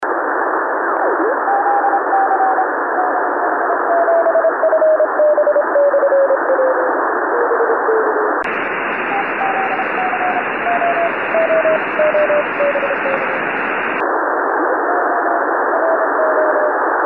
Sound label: iss bounce